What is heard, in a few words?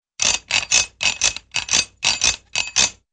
jacking ratchet clicks